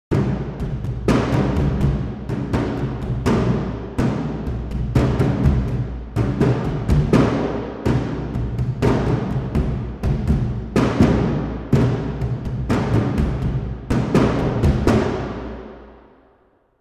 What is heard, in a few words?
suspense drum